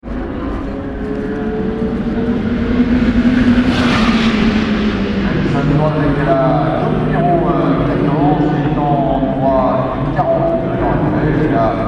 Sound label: audi at night